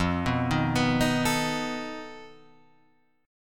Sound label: F 7th Flat 5th F down